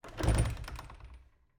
Cabin, front door, split door, oak, large, iron fittings, open, wide; Eiravaein Works; Vaeyan IV